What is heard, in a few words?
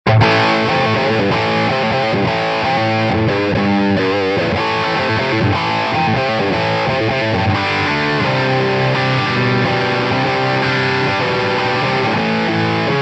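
Pico Plexi Head Mixdown, guitar amp; miniature tube amp; It uses a 12AX7 in the preamp; sound sample 2 - Clean Blues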